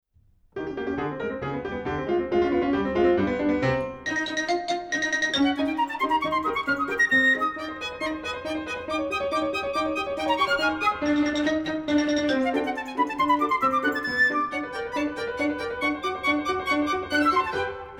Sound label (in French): Bourree fantasque